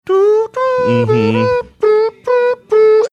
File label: mouth trumpeting